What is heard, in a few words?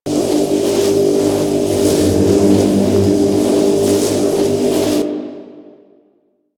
Thurible Loop; Warframe; Priest